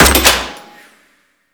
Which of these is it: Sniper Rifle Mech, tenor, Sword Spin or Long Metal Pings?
Sniper Rifle Mech